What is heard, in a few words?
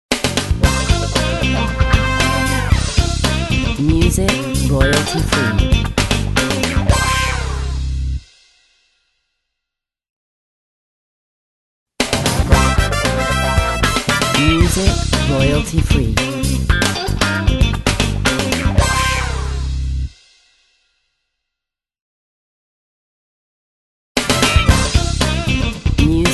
Radio logo